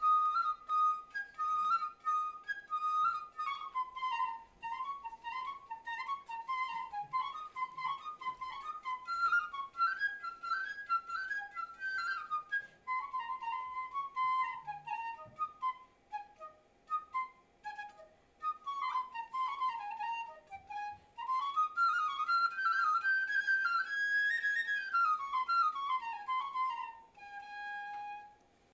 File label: Gigue; De Boismortier